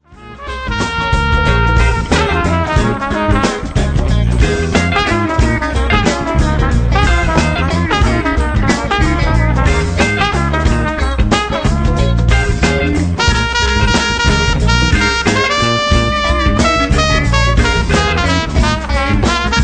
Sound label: Funk, grooves; teaching listening